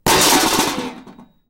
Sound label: Metal Debris Impact Settle